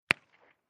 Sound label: Mac10 Machine Gun